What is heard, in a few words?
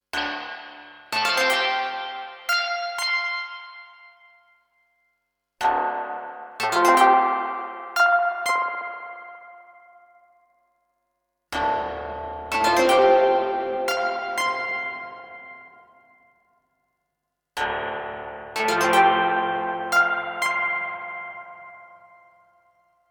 modal; logidy epsi